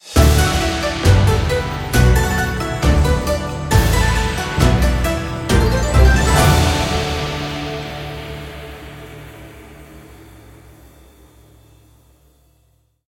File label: jackpot Win; imperialriches